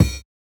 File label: Kick, jungle breaks drum sounds